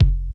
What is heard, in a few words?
tight low end kickdrum sound f key; kick drum samples